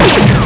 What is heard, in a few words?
laser